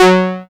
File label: SYN, Microwave; Sound & Vision - Gigapack I CD 2